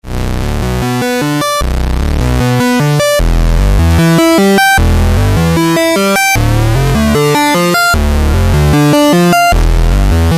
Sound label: dual TH4046 VCO, modular